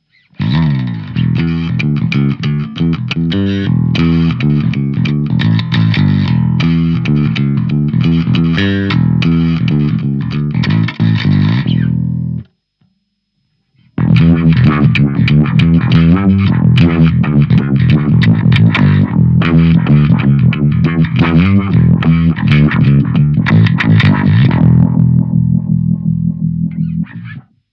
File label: Lush Roto